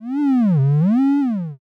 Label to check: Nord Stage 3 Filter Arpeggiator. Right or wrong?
Wrong